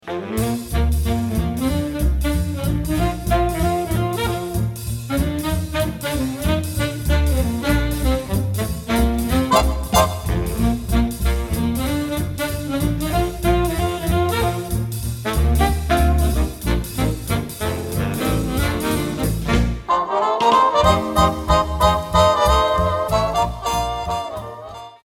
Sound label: swing